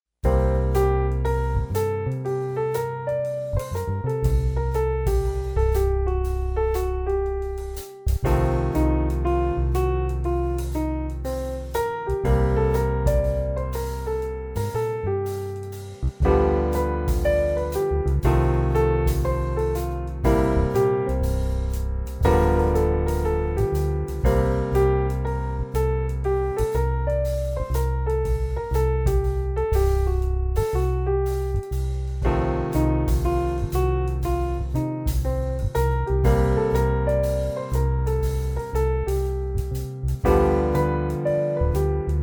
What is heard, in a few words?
Midnight blues, piano